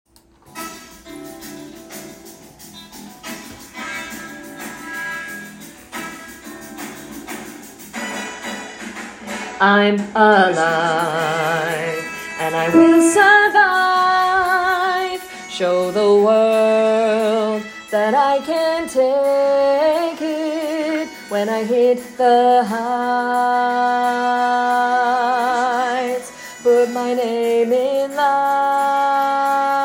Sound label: Melody + Tenor; Fame The Musical - Holiday Pack